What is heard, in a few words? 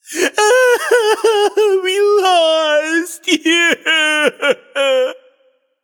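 nonverbal boohoo; smite; voicepack; merlin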